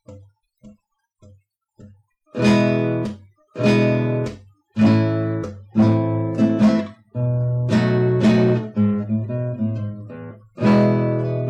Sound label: blues guitar